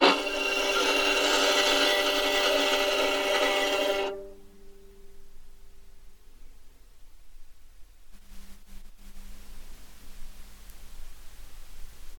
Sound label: NOISE; Sound Synthesis Introduction